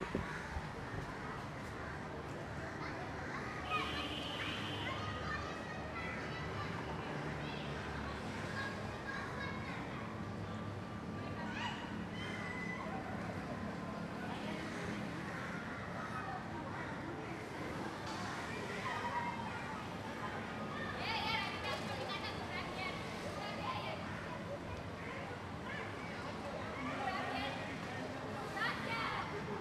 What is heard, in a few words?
Holi Kids